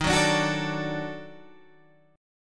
D sharp Minor Major 7th Flat 5th, Guitar, Standard; strummed; chord; 11,9,12,11,10,10